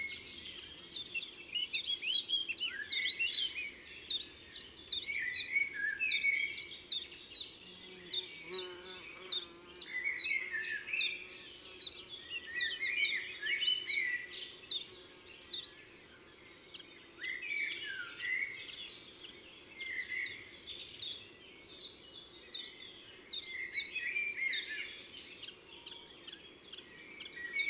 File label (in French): Pouillot veloce; Drome; Concerts Naturels de la Drome; Studio les Trois Becs